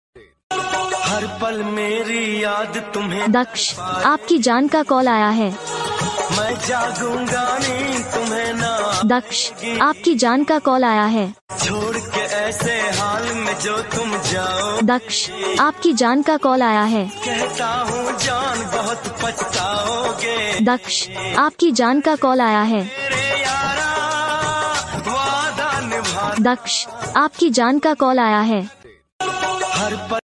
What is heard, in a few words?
name ringtone